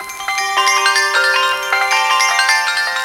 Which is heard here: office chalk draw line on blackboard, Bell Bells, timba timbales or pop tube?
Bell Bells